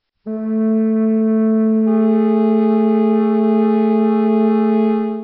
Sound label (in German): flöte; VI. Annäherung dieser Intervalle durch gleichstufige Temperaturen